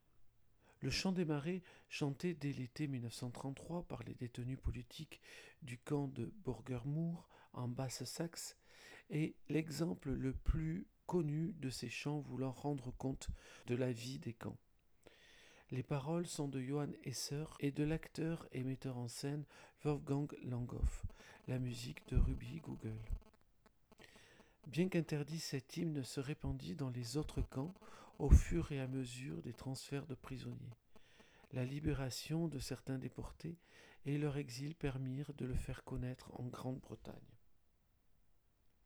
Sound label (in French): le chant des marais